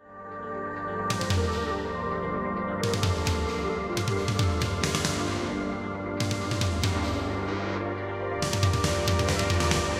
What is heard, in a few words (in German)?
musik 80 kHz